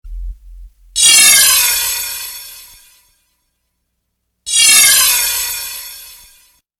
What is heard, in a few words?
Harsh metal